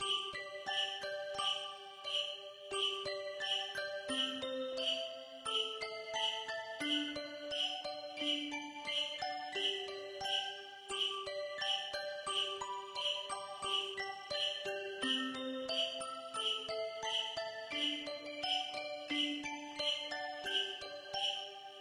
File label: Bgm Loop; Specialbrix S Holidaytree